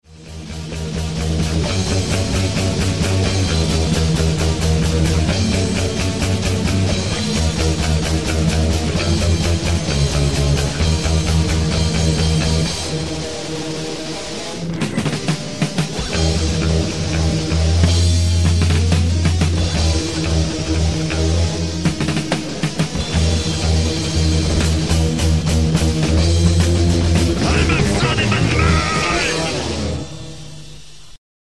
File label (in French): basse chant; un nouvel extrait de basse-chant, final du morceau